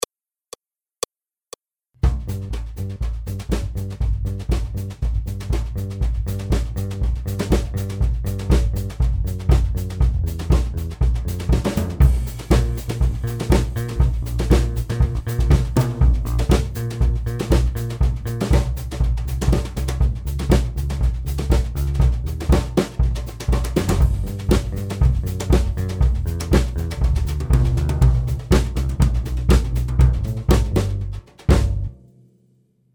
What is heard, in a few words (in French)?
Bercocop Accompagnement seul, Jazz, piano